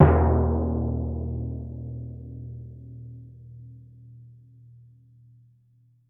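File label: timpani mezzo forte E1; Best Service; Peter Siedlaczek's Advanced Orchestra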